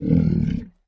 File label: land idle, guardian, mob; Minecraft; Votive World